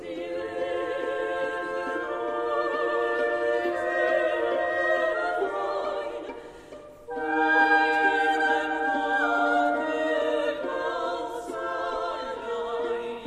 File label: FLUTE Quintette